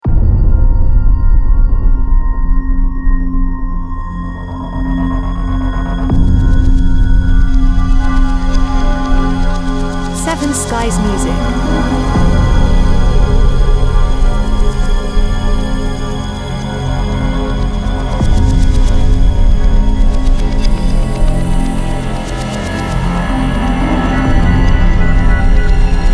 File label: Ambient Dark Film Series